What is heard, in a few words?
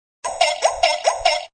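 Funny Toy Sound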